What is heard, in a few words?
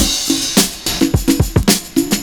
PERCS, 70 s Breakbeats